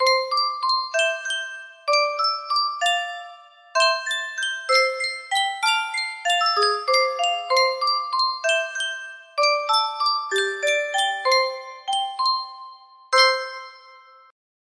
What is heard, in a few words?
yunsheng music box, melody